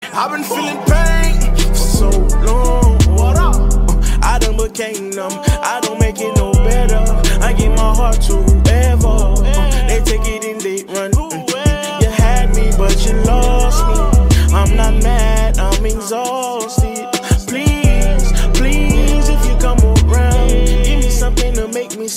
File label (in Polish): Instrumentalny